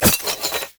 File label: item sightward; LoL; soraka